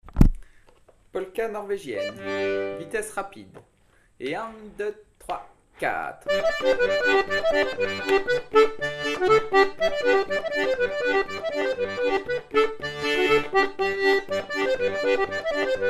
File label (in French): polka norvegienne rapide